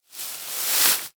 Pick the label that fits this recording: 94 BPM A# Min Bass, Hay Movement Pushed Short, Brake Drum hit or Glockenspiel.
Hay Movement Pushed Short